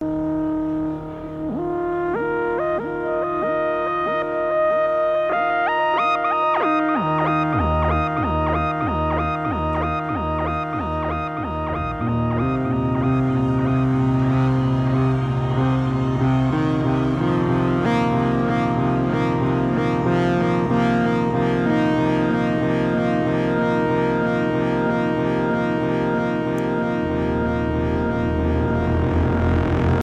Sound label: EWI 4000